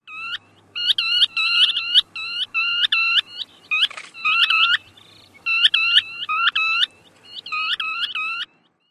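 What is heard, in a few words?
Baird's Sandpiper calls of two birds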